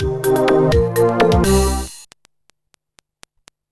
trade jingle; Hannes Seifert; Trackers; Modland; burntime trade jingle

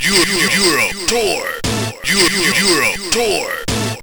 beatbox; Pinball Dreams

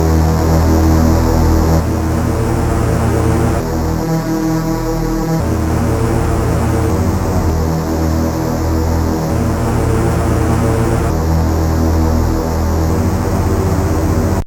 tension; Salva

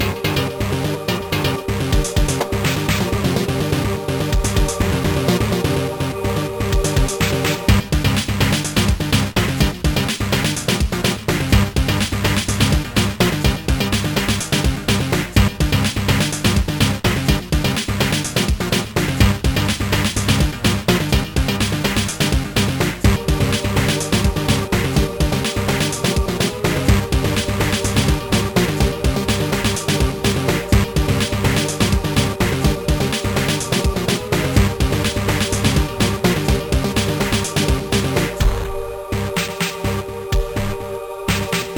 drum machine; Blacky (CH)

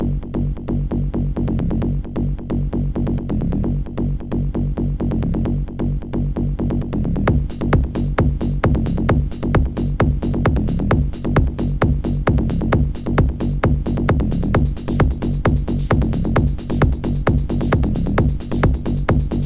hohoho!; MED

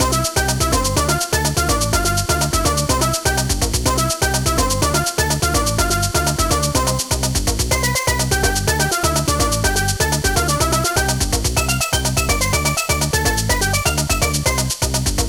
steel drum song; MED

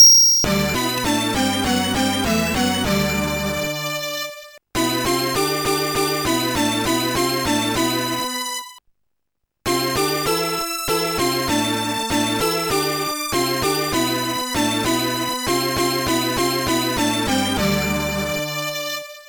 Music Box

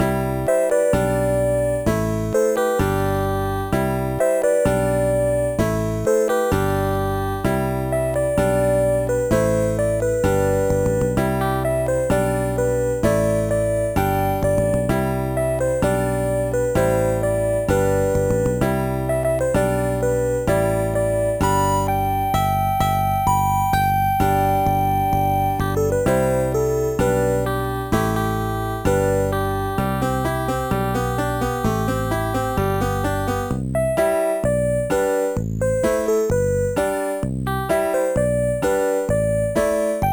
groovy; Deluxe Music Construction Set

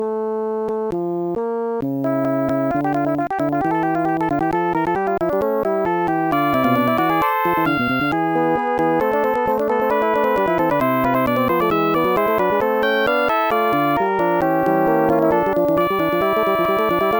requiem; The Music Studio

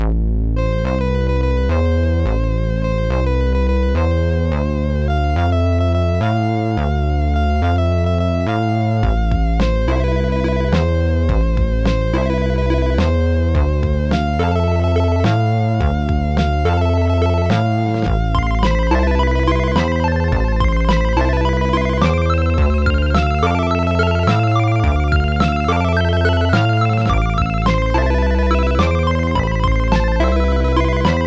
rumba; MusicLine

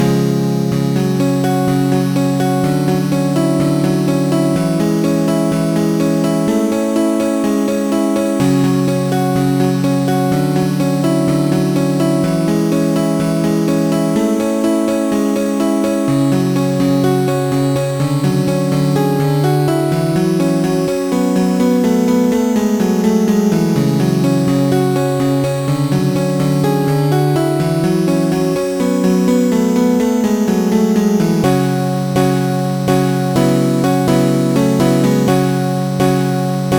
piano intro; Trackers; Modland

